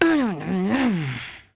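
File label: female angry; People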